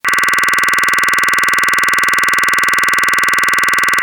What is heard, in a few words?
gearbox vibration noise